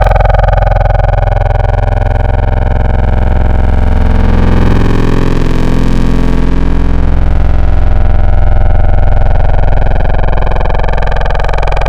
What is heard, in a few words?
FM, Wavetables